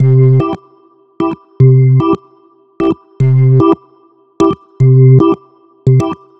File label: Simple Organ